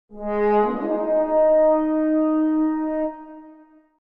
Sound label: Horn; Fargowiltas; tml